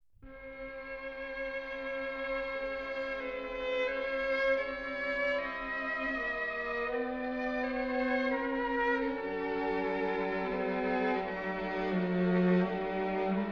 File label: Quartet D Major, String Quartets; Béla Bartók